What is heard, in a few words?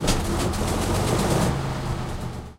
interior engine switch, engines tanks; War Thunder